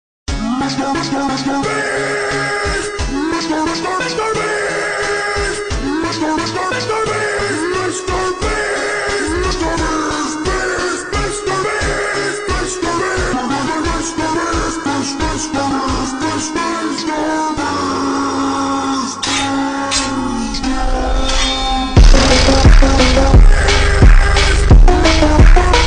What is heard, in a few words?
phonk slowed reverb; mr beast